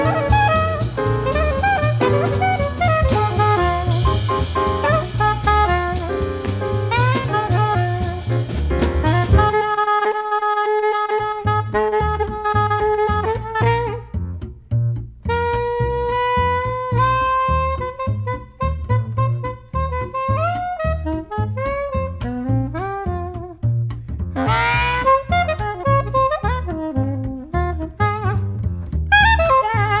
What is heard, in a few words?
jazz